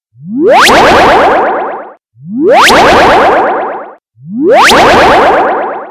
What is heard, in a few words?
Vibe msg Tone